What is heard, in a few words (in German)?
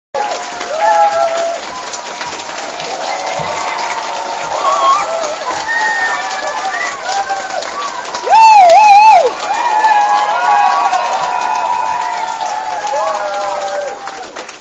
Applaus Schule; Pocahontas; Schule am Erlenbach 2018